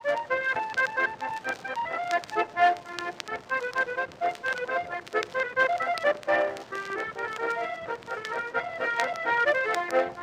Polka Warszawska wiszniaczka Warsaw polka; edison

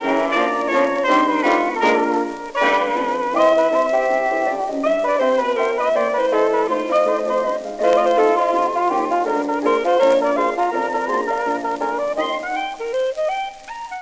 jukebox

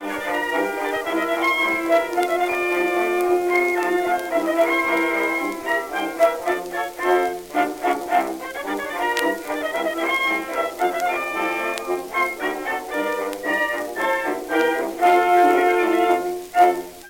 jukebox

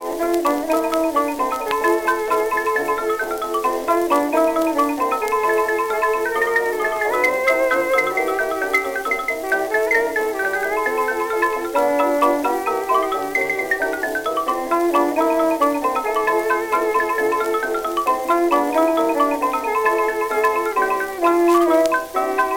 jazz

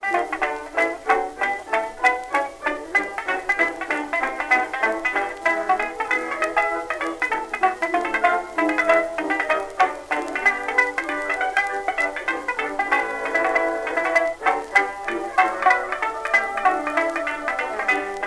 jukebox jazz

